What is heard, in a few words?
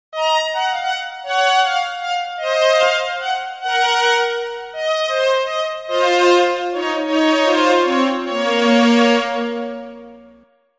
Strings